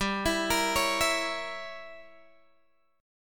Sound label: G Diminished 7th, Guitar, Open G; x,12,14,15,14,14; down